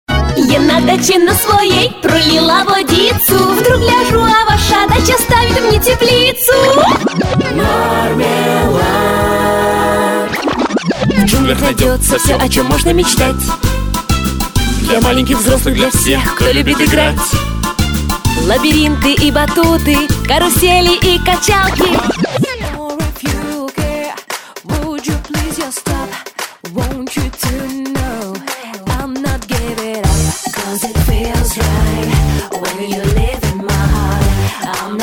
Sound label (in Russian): vokal